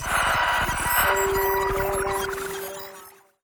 relic entangled relay; Stellaris; grand archive; relics

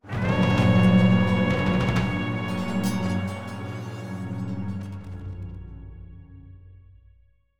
hunter fanfare aggressive; Stellaris; grand archive

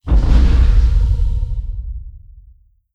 distance stellarite attack plasma; Stellaris; guardians

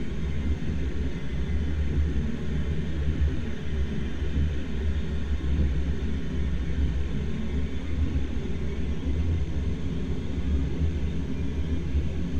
habitat station hum; Stellaris; utopia

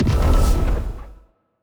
healing hit, weapons; Stellaris; biogenesis

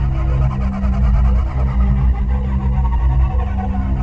dimensional horror windup; Stellaris; guardians

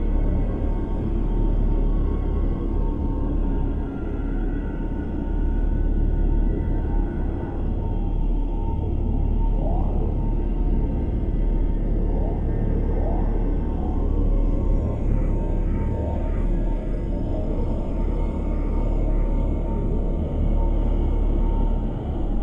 ship habitat idle; Stellaris; shroud; ships

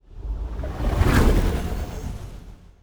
construction ship move; aquatics

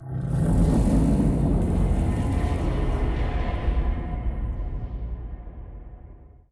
mutated void worms muted; Stellaris; grand archive

